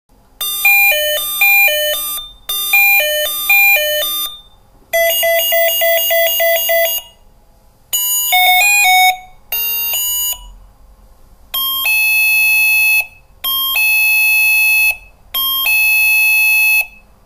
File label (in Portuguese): melodias